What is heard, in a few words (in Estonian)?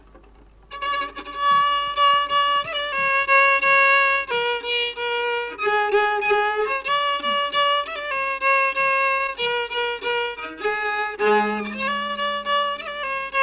Ruhnu viiul folk; Geitleiken Kitselugu